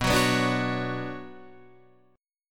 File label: B Minor Add 9th B, chord; Bmadd9 strummed; madd9:1,b3,(5),9; JGuitar